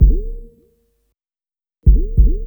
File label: PERC, Hip-Hop & R&B; USB Soundscan vol.47; AKAI